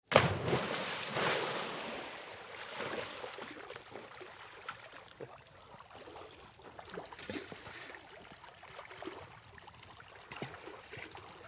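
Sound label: Splash Distant Cannonball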